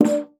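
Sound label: D4, bass flute; Xsample VOL-2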